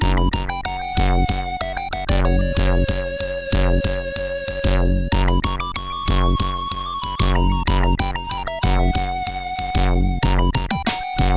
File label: arabian